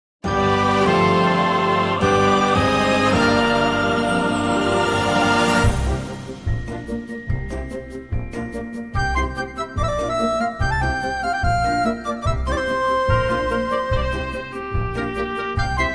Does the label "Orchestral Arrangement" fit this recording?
Yes